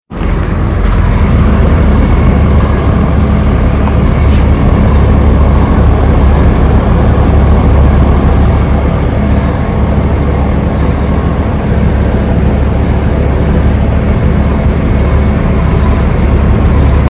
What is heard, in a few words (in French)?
bruit bizarre ue hitachi amplifie; Bruit bizarre venant du bas de l'unité extérieure Hitachi; climatisation